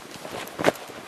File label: hands draw